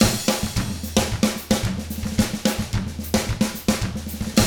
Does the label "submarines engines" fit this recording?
No